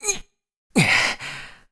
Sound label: Link Carry Stamina Low; Skyward Sword